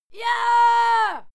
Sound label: female fight scream